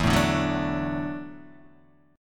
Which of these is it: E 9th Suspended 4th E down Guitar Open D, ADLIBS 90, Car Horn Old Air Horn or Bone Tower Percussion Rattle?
E 9th Suspended 4th E down Guitar Open D